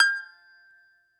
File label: BAGLAMA G0WL; E-MU Producer Series Vol. 7 – Old World Instruments